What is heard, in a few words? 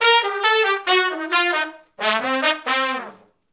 R&B Riff (C) unison, Horncraft; Sony Creative Software; Crimson Blue and Fabulous Horncraft 4 RnB; Horncraft for R&B; Sections